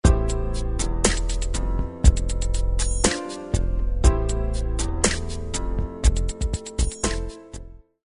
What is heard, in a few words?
ringtong; Gionee GN205